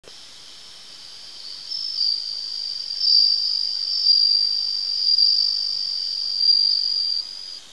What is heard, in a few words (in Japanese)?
suzumushi